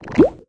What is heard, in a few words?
Dlog Appear